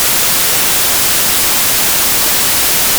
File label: noise